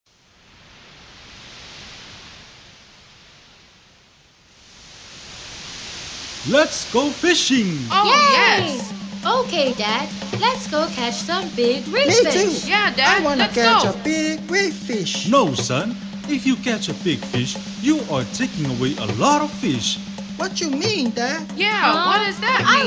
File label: Alleluia Alto